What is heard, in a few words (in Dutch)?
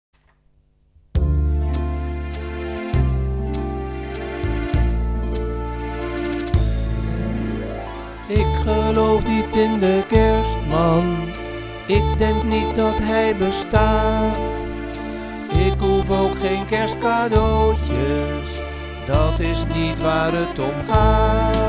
melodie; lied van de maand